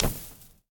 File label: Clap; Smite; god Kumbhakarna